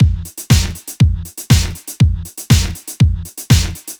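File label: 120 Bass Drum Snare, Miami Bass; Zero-G; Soundclash Nu Dance Electro; Combi Loops; 120bpm; Miami Bass Machine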